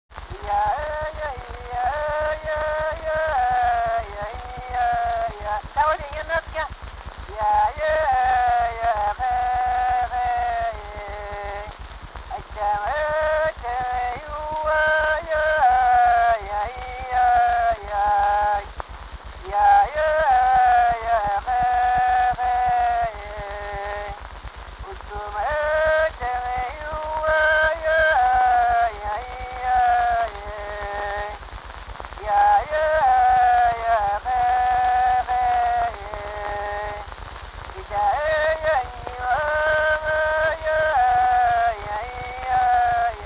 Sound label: duel song inuit